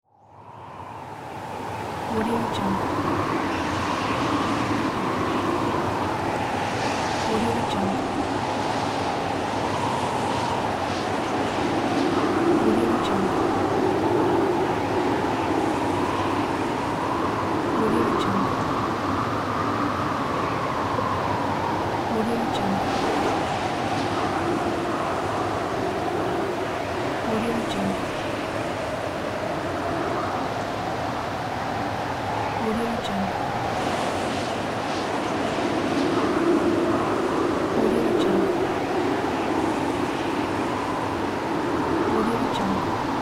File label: wind